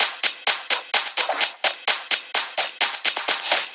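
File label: Drum Loop 128BPM hi-hat; VEC5 Drumloops; VEC5 Multi Loops; Loop Elements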